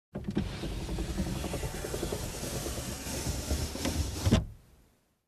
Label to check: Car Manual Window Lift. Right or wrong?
Right